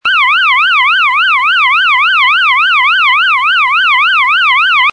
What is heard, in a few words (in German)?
polizeisirene